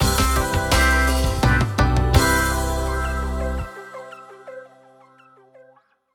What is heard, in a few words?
win stinger